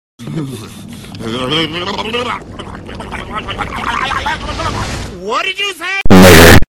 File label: ahhahahah